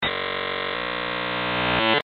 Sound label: internet sound